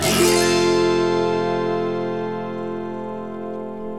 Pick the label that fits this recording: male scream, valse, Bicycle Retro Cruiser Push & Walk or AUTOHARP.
AUTOHARP